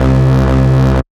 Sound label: BASS Greasy, bass; M8; Electronisounds